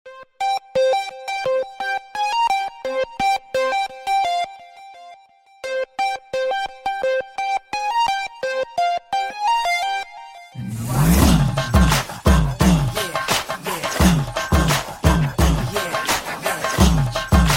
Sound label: Dancing Music